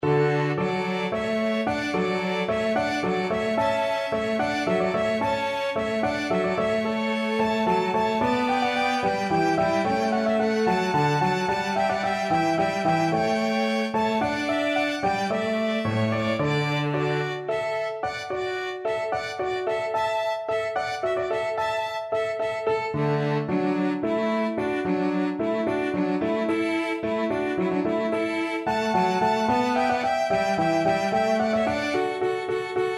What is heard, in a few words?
water music piano quartet